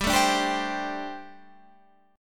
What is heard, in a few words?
G Suspended 2nd Suspended 4th G, Guitar Half Step Down